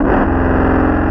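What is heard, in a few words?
Rhythm Guitar; X2; SPCTool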